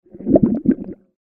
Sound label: stomach growl gurgle